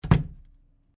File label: Cardboard box hit